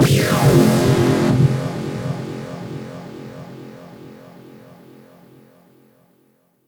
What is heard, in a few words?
Tonal FX F